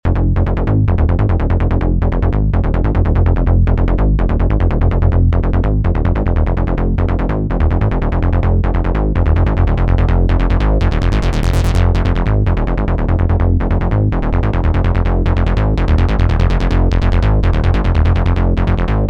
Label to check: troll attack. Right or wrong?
Wrong